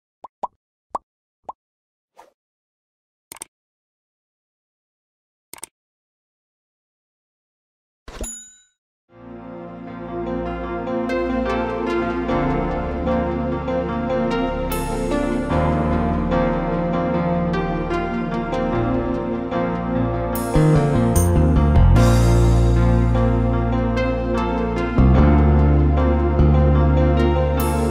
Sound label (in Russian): музыка для души